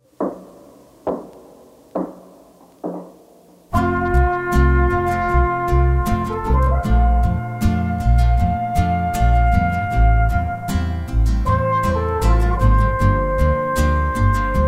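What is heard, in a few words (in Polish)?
hejnał krakowski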